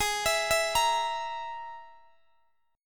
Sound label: G sharp Suspended 2nd Sharp 5th, Guitar DADGAD; x,x,18,21,19,20